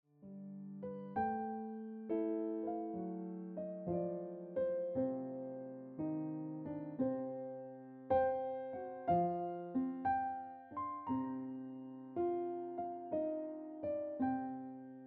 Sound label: Cool Breeze Ringtone Piano Music